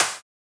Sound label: Snare - Whisp; Psytrance; Snares